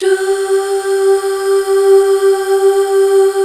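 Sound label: Duh Female; Best Service Gigapack 1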